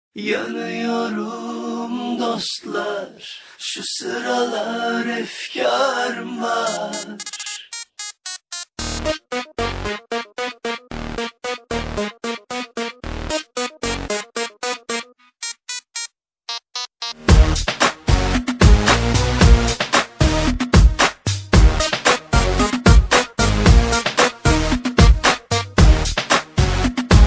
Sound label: turkish ring tones